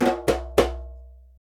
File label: Conga Licks